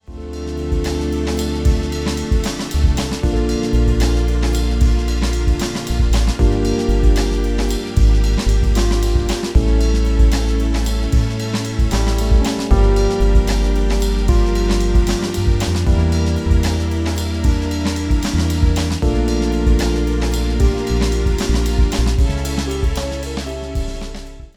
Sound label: Jazzy waves